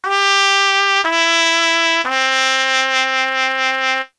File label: 3klang trumpet; echo